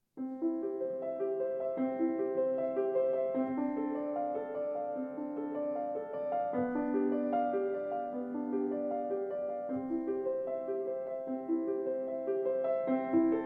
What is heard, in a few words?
Prelude in C Major, Well-Tempered Clavier; Book 1; Prelude in C Major, BWV 846